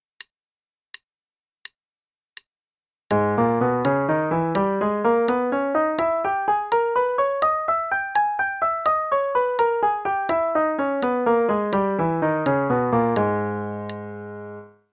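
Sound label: g sharp harmonic minor, 3 octaves (qn=84); CM Level 6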